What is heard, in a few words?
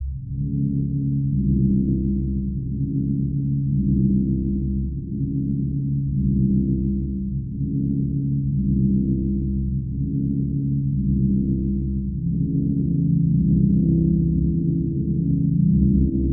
underdrone; mors; Amnesia: A Machine for Pigs